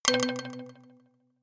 notification kalimba delay octave low

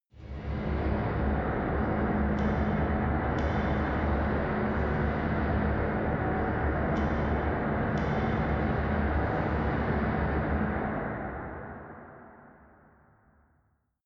machine room drone metal click

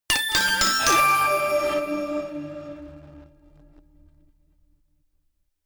notification glockenspiel crazy sequence descend

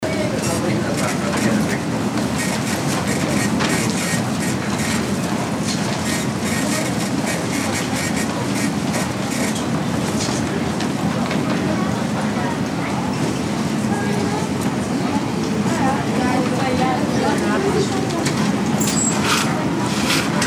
department store atmo

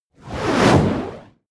whoosh

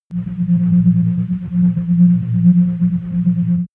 starship energy core

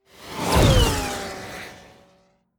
flyby

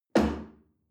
chair wood place medium

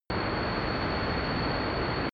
drone spaceship screech

